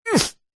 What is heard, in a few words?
human male short grunt through nose; zapsplat